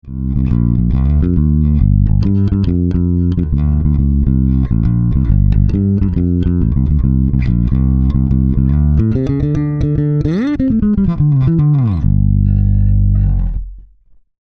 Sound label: 70s Finger